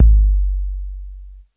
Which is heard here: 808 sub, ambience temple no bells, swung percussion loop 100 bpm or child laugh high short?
808 sub